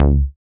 short bass; Dance; BASS SOUNDS; Masterbits; Dance Trax

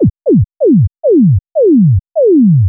fil lazers pan, fills

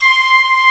LEAD, HOUSE

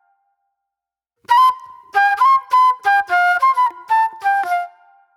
Soul-Jazz Flute, Call And Response

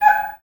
BIRDCALL, Heart of Africa; Spectrasonics; BIRDMEN

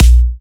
Dubstep Kicks; Vengeance - Essential Clubsounds Vol.5; Bassdrums

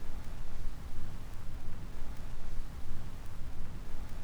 Tape Noise; layering

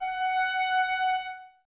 clarinets, Wood Winds

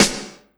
SD, Drum & Bass, 150 BPM

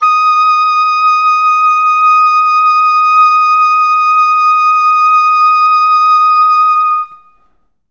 oboe, D#5; Best Service ProSamples vol.51; Classic Orchestra 2

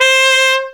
cornet lips C5; AKAI S-Series CD-ROM Sound Library VOL-2

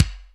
Kick, Akai MPC500; Toolkits

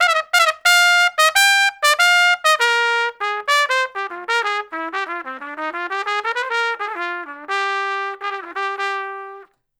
Trumpet Solo, Jazz Traditional; Sonic Foundry (Sony Creative Software); Jazz Solos and Sections

